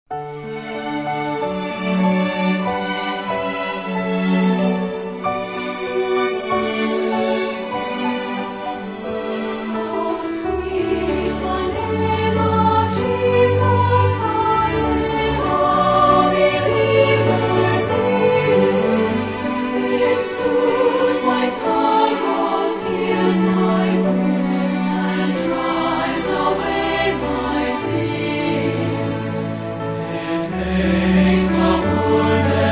Singers And Orchestra

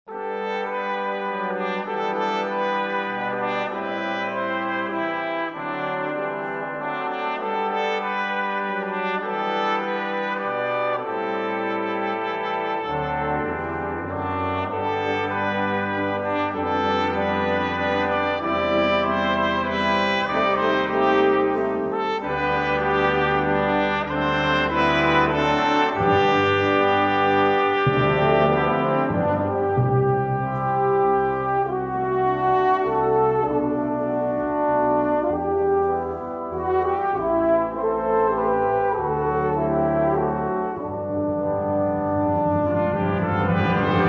Brass Choir

